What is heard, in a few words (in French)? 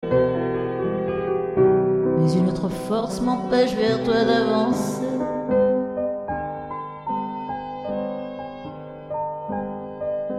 a quand ce jour acoustic